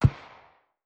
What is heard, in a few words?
Handgun Shot; liblast; Weapons